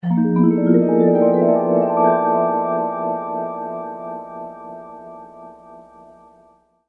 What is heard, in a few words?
Magic Vibe Tone